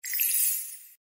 jack open, Sony Ericsson; Z530i

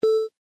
Minute minder; LG KV500 Cookie

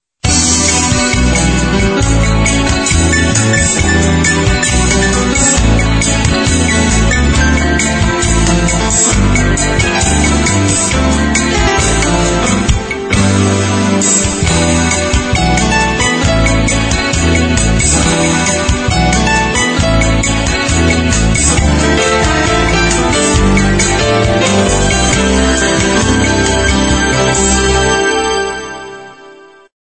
Ringtone

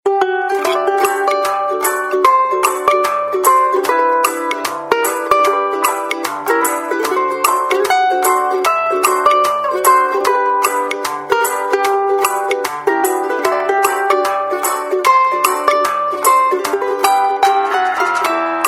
Ukulele, phone tones; LG JN240 Remarq

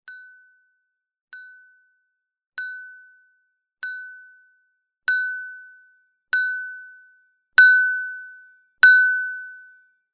Porcelain Wake-up Alarm

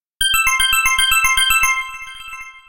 Bell Ring, ringtones; Asus Zenfone-3-Deluxe

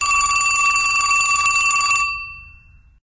Vintage Telephone, ringtones; LG P500 Optimus One

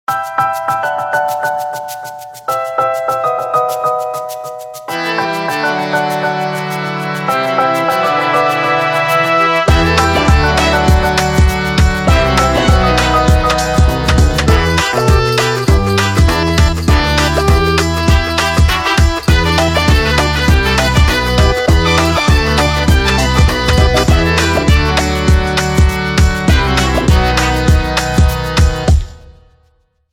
Swing by; Nokia E6

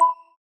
Error

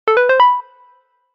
Digital Step; Yezz Max-1

